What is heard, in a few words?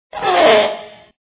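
creak; Vinni-Puh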